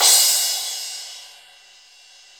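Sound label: cymbal crash; Best Service Gigapack 2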